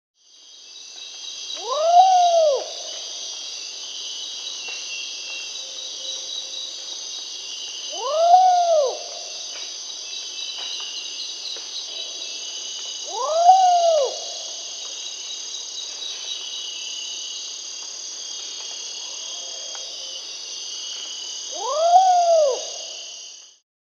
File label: Long-tailed Potoo (Nyctibius aethereus), Peru; S. America